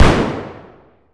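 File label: weapon lmg fire player atmo int; gmod; viper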